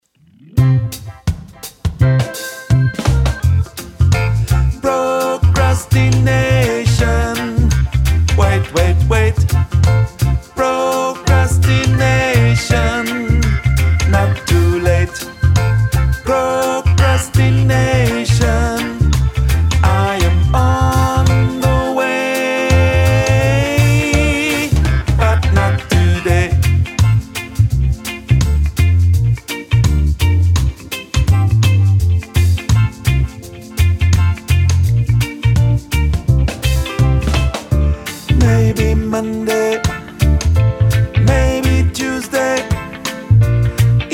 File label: Tenor